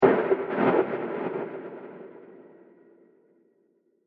High Crash Diffuse With Delays; Bursts; Impacts; Crafted Signals

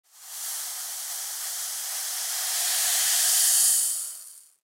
Sand Pour, Foley Sand Pour From Pail Spill; Playgrounds; Blastwave

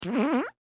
Human Fart Squeak; SFX Bible

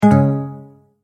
multimedia tone error

